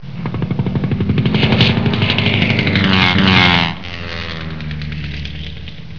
BIPLANE

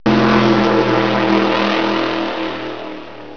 motor; robossy

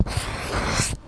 SCRTCH; SCALA

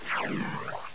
ROCKET; LEDWARS

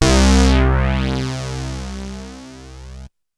MOOG NOTE; BEAT2000

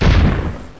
FLAK; THEXDER

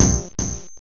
tap

